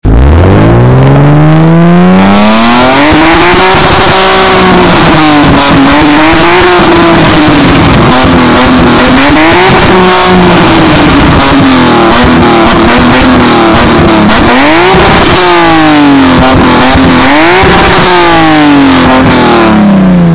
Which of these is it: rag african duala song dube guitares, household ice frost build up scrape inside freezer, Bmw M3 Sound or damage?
Bmw M3 Sound